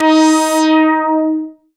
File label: SYN Resotron; Best Service Gigapack 1